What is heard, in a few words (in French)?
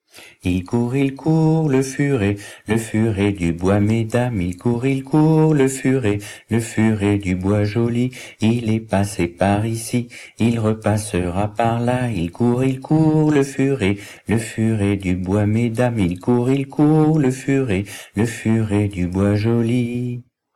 furet